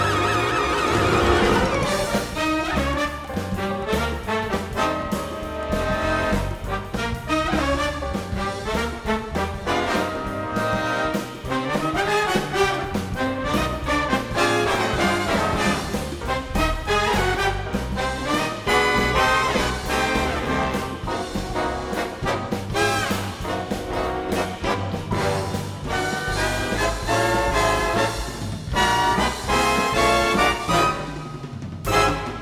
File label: Swing; lego the incredibles 2018 switch; Redbrick